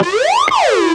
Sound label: alarm, FX & ATMO, ALARMS & ALERTS; Techno Trance; Techno Trance Essentials